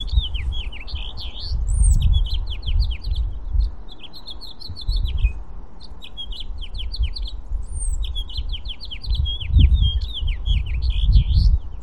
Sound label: House Finch; Lyons CO